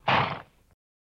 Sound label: Horse Blow Generic